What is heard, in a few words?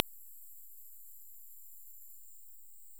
Kerivoula papuensis